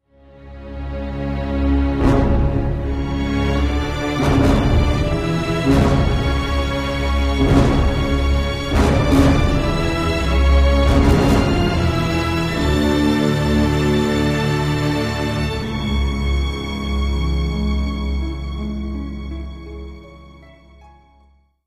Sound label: failure; mohaa